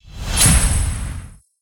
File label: free Spin Climb Level Att Screen; steamtower